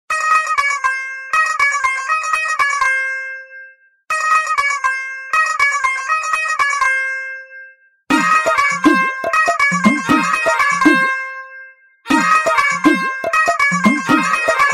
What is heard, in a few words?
Tumbi Tone